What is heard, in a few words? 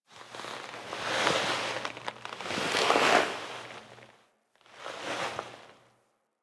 SFX Hand Look Foley; Stalker; Warframe; Orbiter Nursery